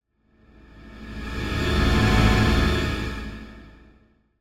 Symphonic Hit Drawing Close To The Fear - Short Pad; Hollywood Edge; sound designer's toolkit v2